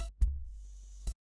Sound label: ui beep